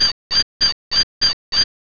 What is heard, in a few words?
squeak; Kids' CD-ROM Bible Fun Pack; Education Publishing Concepts; NOAHDEMO